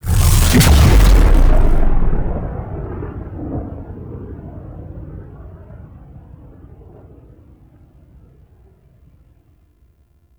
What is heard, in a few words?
Blast Off; Warframe; The New War; Cinematics; Drifter Lotus Operator Awakes